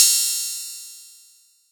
crash cymbal single hit c key, Royality free crash cymbal sound tuned to the C note